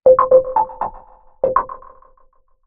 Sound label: Bubbles; Sony Ericsson U100i Yari